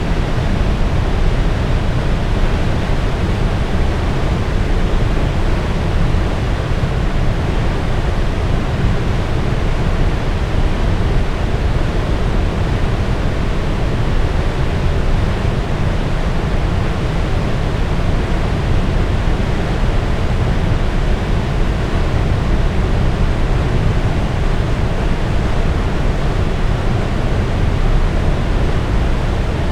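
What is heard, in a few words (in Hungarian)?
több emelet mélységbe lezúduló víz magasból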